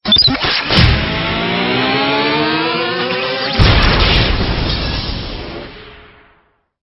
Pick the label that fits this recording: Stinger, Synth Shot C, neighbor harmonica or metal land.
Stinger